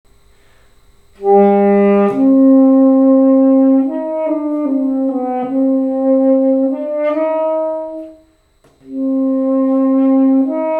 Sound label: Tenor Sax D minor; 8th grade all state